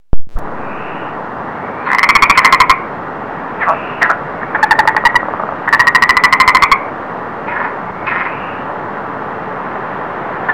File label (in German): Revierkampf, Froschkonzerte; biotop